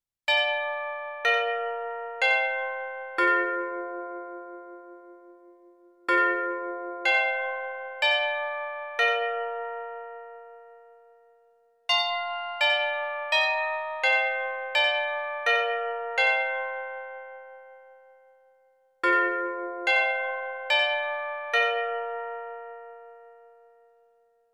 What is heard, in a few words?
ding dong merrily on high midi